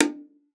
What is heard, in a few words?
BONGO HA1, bongos; Best Service ProSamples vol.42 - Session Instruments